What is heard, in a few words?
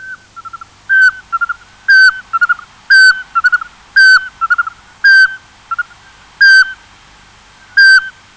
Glaucidium passerinum; Сыч воробьиный